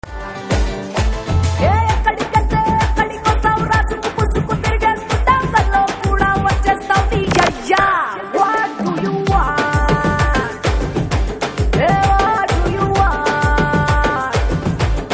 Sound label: Romantic Telugu Ringtone